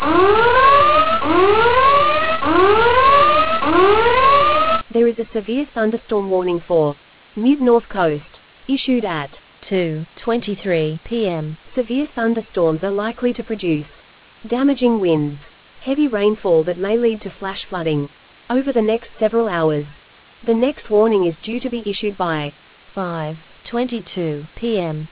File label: storm audio